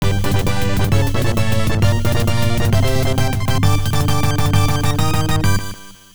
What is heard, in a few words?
Enjoy Our Victory [Stage Clear]; Olmang Jolmang Paradise; ms-dos